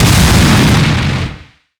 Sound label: explosion